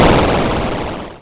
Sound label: atari boom